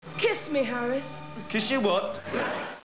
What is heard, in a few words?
KISS